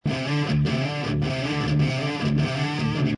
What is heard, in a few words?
Brit Stack Drop D; Loopology